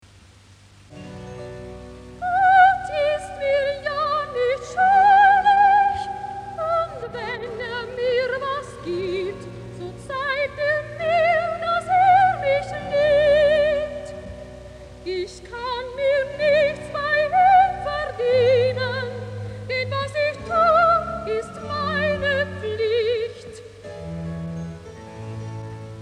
Recitativo, Sopran

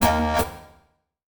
Transition Hit Impact Electrical

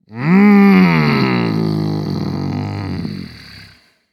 Horror Scary Frankenstein Moan

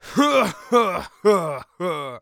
Human Male Pirate Laugh Voice

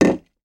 Household Drink Set Down Can

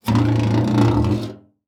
Foley Metal Scrape Vibrating Scrape

